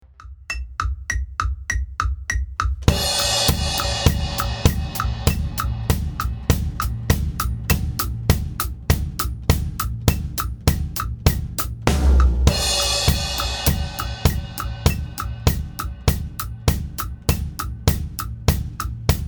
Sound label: Drum